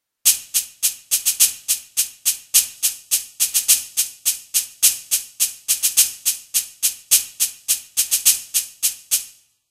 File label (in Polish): marakasy; Nagrania Lokomotywy; edukacja wczesnoszkolna